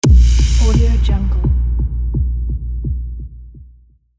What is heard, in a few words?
angry hit; floraphonic